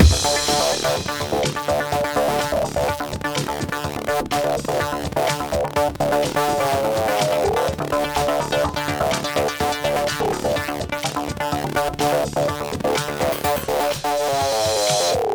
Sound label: techno